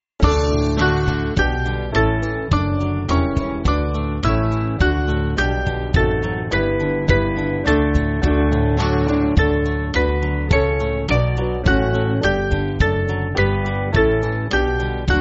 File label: Band, church music